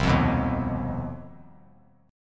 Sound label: C Minor Major 7th Double Flat 5th C down 3C 3F 3A 3D; strummed; JGuitar